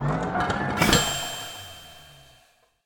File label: Printing